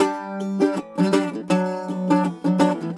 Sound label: Celtic Flavours; USB Soundscan vol.42; AKAI